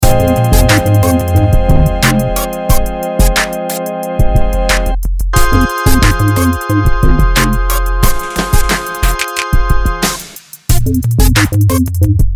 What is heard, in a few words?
electronic hip hop